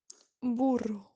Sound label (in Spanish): Burro audio